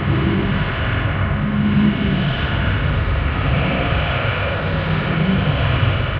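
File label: courtyard machine loop; hl2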